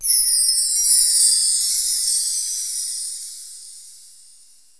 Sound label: Windchimes